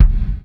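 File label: gated bass drum, Drums Acoustic; USB Soundscan vol.10; AKAI; 90s sample CD; GATEKIT 2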